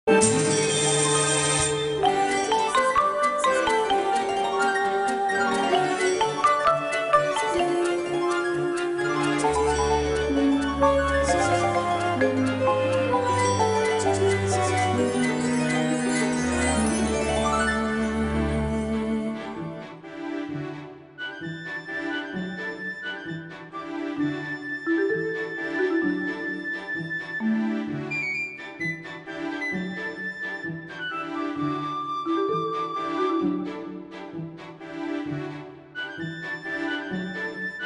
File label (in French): Courage de Farore à la lyre; Skyward Sword